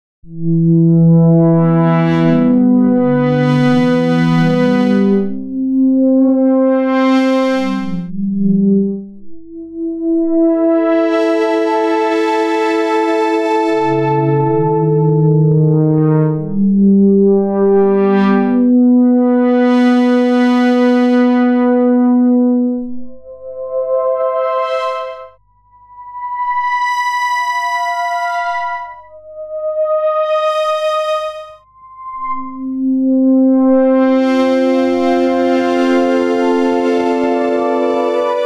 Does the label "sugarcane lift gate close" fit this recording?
No